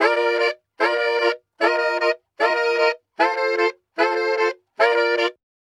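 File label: Sax Section 076bpm Bb, Saxaphone; Quazibeat; African Secret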